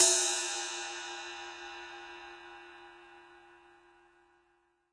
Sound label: CYM RIDE, DRUMS SINGLE HITS